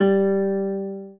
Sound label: spanish guitar